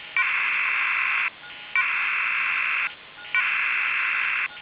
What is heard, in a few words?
modem